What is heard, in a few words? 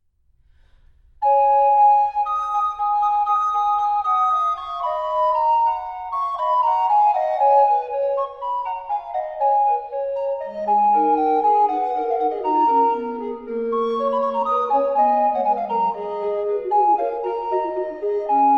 Recorder Quartet; Obo so geb' der Mann ein Pfenning; Ludwig Senfl